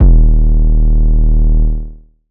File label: bass 808 pang F; Polyend; ARC Noise